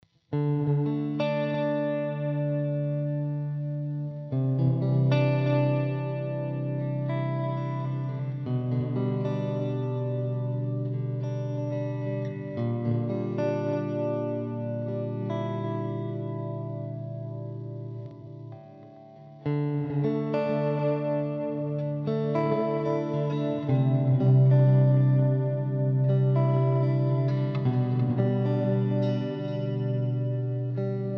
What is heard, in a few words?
Reverb Reverse; LOOMER